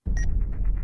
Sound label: beep